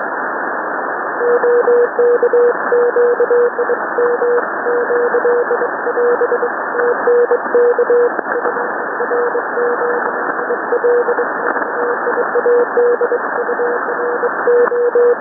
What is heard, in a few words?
sending random prefixes